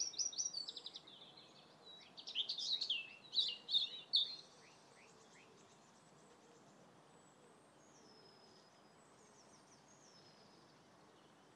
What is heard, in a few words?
the uplifting sound of spring wren v robin